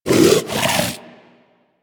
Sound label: Vocal Breathe, Hunting Critters; Warframe; Vulpaphyla